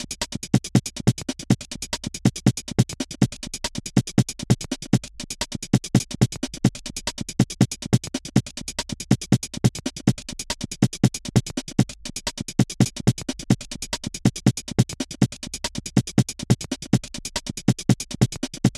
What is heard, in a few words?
drum chop and kicks